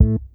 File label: IN HOUSE A2, bass; Sampleheads; Ultra Freakin' Bass; IN HOUSEBASS